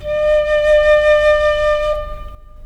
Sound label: piccolo